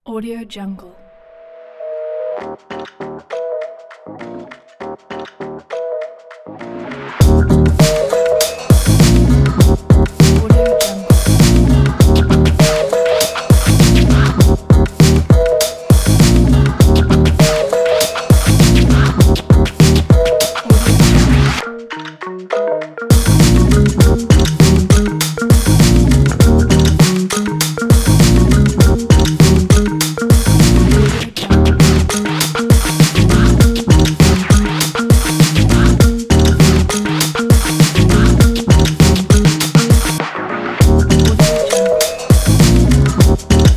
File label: hip hop electronic